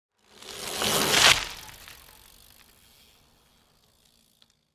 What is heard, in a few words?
Bicycle sport bike pass by fast speed